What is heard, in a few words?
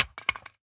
phys mag drop smg poly concrete, magazine drops